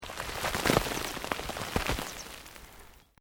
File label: EARTH LOOSE MOVE LONG, Debris